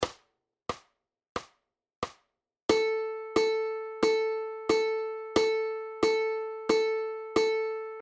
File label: Quarter Notes, ukulele; understanding rhythmic notation